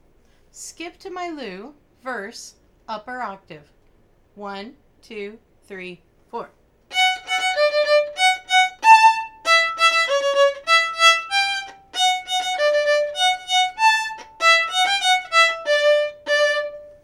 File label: Skip to My Lou Verse upper octave